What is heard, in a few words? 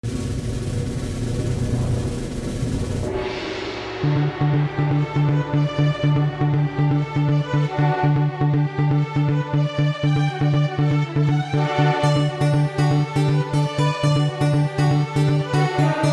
Swing